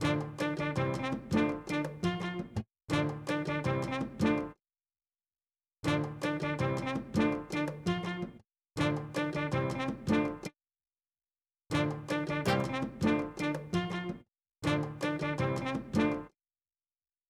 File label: Melody